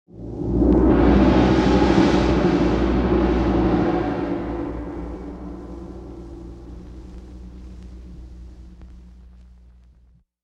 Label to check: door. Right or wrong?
Wrong